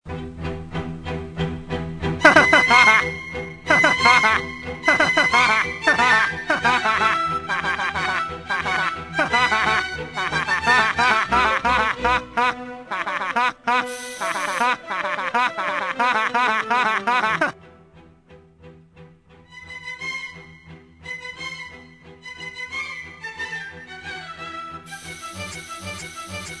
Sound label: laugh song opera